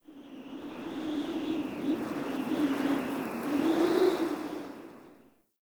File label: Under City Skylight Wind Howl Gust